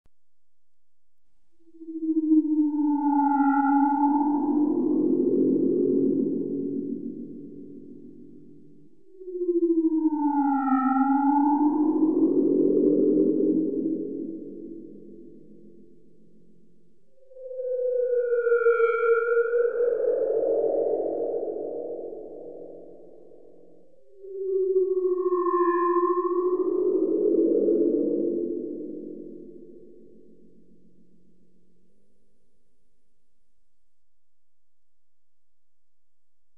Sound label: Eerie electronic noise